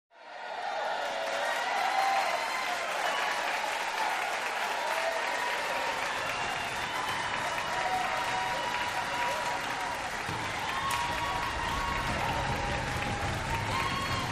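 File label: Crowd 100 Interior Cheer; medium int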